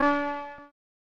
Continue Ping; Iron Commando; SNES

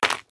Cave Footstep; The Inn

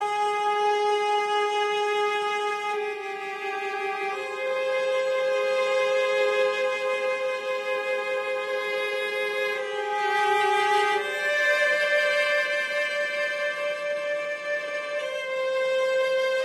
Emotional loop

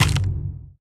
UI Button

